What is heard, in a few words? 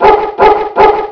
Dog; wanko; inu